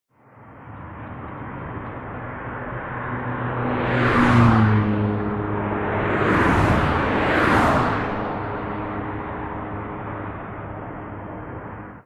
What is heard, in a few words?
Cars in Speedy Road